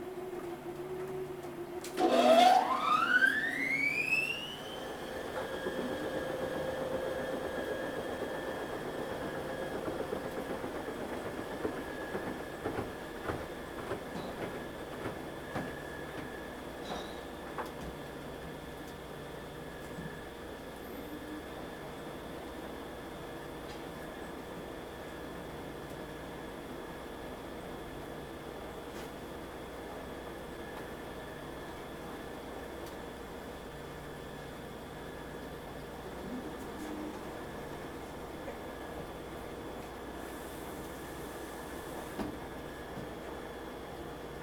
Laundry Washer Spin Cycle; Home & Office; 1001 Sound Effects

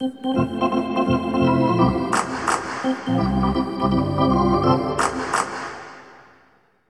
Hockey Organ

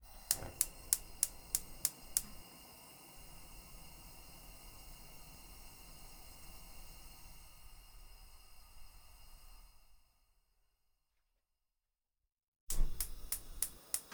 oven (hobs turn on); devices accessories utilities; NS Studios - ultra sound collection

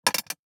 DICE on Glass, Throw and Roll, Metal, 1 One Die; Samples; ArticulatedSounds; DICE Sfx Party (Extended)

